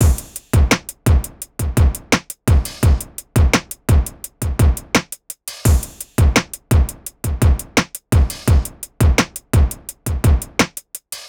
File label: Hip Hop Beat, Drums